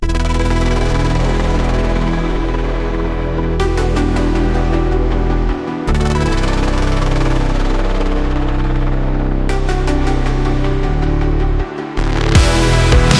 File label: synthwave